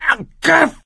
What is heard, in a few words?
choke; source engine; left 4 dead 2; survivor